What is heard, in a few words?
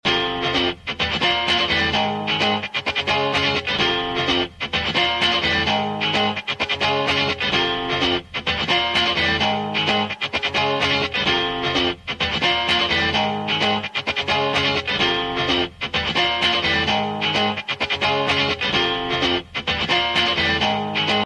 guitar